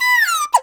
CHINA, Heart of Asia; Spectrasonics; CHINAFLT

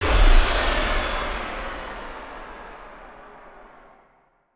Huge Verb Tail, Long Reverb Tails; impact samples

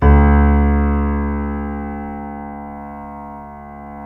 C7 PP, The Ultimate Piano Collection; East West

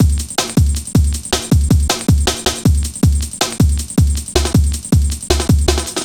Drum Loops, 158.5; Jungle

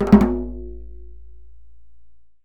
ROLL

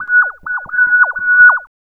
FX LOOP; Big Beat Samples & More; AKAI; FX LOOPS KIT

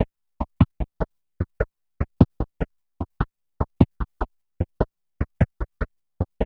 GRVD, Drumz, Grooved; E-MU Producer Series Vol. 6 – Analogia Project

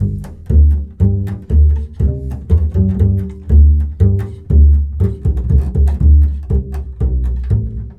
Bull Fiddle, Appalachian; Continental Drift Aftershocks

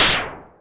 Cwejman S1; Short Snipit; DevSnd Cwejman S1 - RD

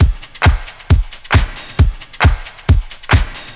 DRUM, 132; Megabass Remix! 2

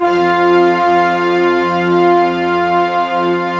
orch F3; AKAI S-Series CD-ROM Sound Library VOL-2

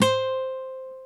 GTR Nylon, Roland; Club-50 - Foundations Roland; Nylon Martin

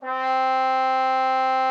trombone C4; Xsample VOL-3

